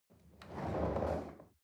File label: chair wheel roll on floor soft